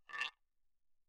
guiro, toward, mf; Percussion; hand percussion

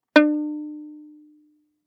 Violin pizz ff sul D Eb4; MIS Pitches - 2014; Strings

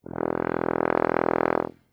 Tuba ff E1; Brass

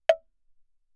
granite block, ff; Percussion; hand percussion; graniteblocks

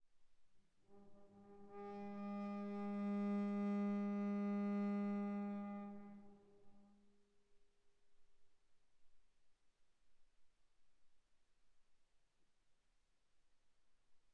Viola arco sul A pp A4 B4 stereo

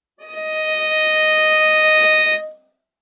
Viola arco ff sul D Eb5 stereo; MIS Pitches - 2014; Strings

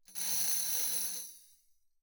tambourine thumb roll ff; Percussion

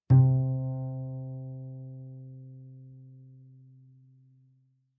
Bass pizz ff sul G C3, Double Bass; MIS Pitches - 2014; Strings

